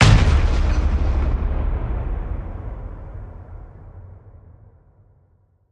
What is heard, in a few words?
hit